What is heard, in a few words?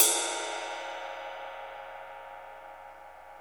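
cymbal ride, Roland; Club-50 - Foundations Roland; Ext.Snare 3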